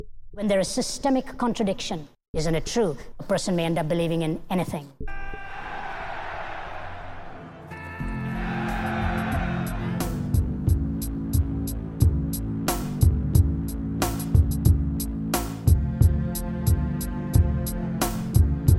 beat

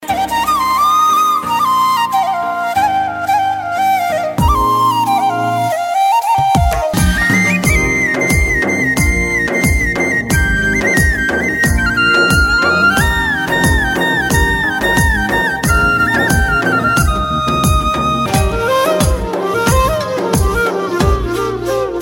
flute

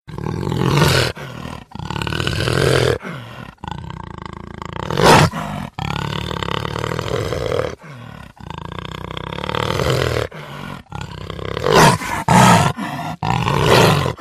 evil jaguar; animals

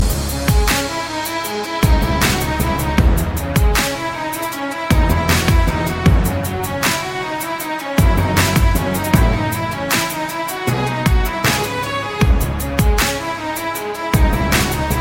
best hiphop ringtone

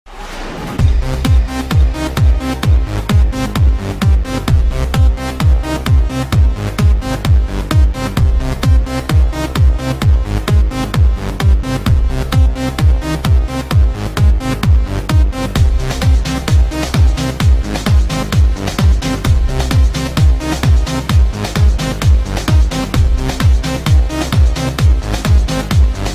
Trance

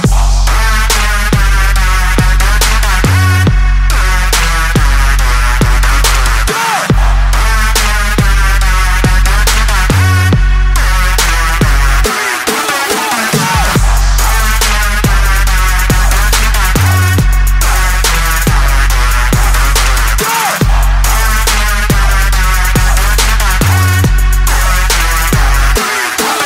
Trap Tune

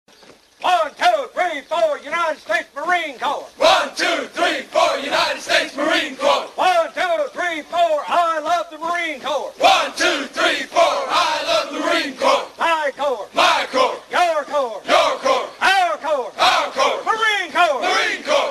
marine cadence

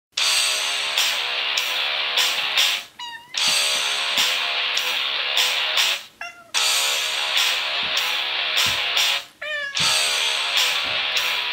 miumauh cat rock

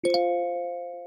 Chords Alert

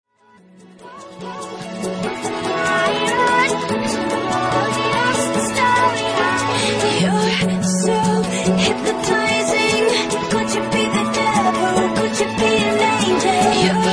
dubstep mashup, ringtones

